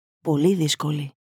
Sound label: Very Hard Breathing; Greek